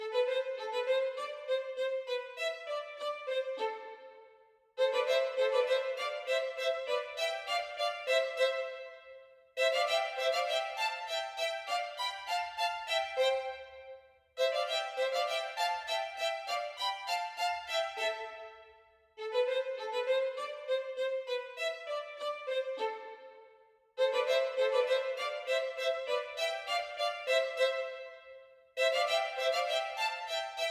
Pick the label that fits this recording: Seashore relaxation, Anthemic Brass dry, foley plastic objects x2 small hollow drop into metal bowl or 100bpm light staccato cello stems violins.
100bpm light staccato cello stems violins